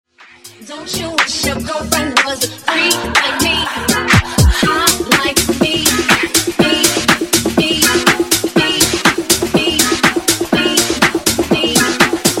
Funk Vocal 122Bpm

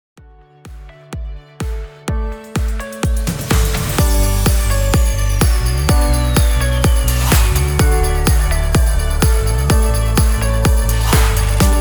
PROGRESSIVE 126 BPM

